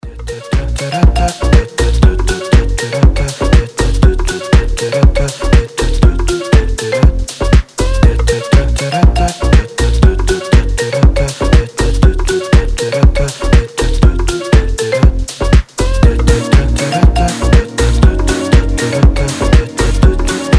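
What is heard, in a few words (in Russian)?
grustnij trombon, sad trombone; Lyriq; Грустный тромбон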